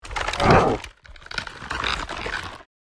Werewolf fangs growing transformation

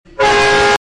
Loud train horn blast meme

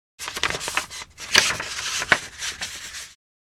Paper handling folding

